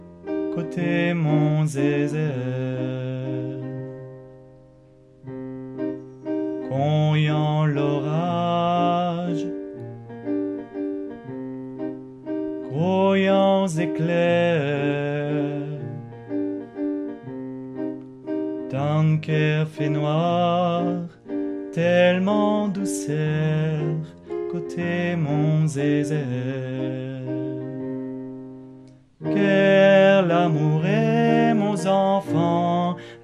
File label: tutti